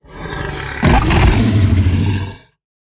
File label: Megalania sound